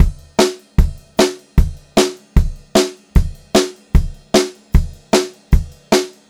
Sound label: 152 SPEED RIDE, Pop-Rock Drumloops; USB Soundscan vol.37; AKAI; 90 s Sample CDs